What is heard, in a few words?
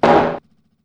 kart collide concrete; tf2ware ultimate; mariokart